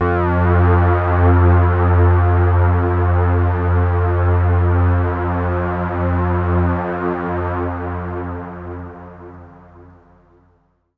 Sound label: Dark Drone F, Ambient; Trance Formation